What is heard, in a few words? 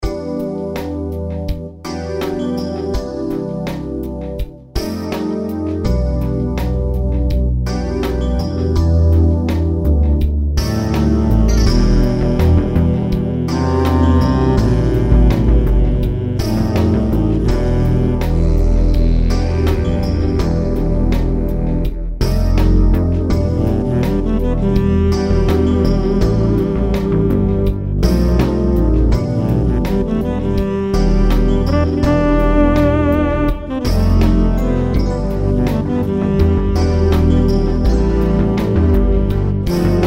Chill electronic lounge